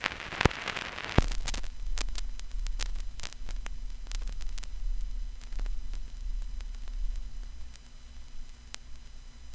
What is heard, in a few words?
vinyl crackle; 99Sounds; Vinyl Noise SFX